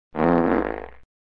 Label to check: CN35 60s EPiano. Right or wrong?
Wrong